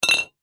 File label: bottle 660ml glass beer empty knock over on concrete